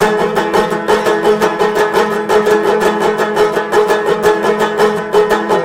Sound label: banjo; Left 4 Dead 2; zombat; danger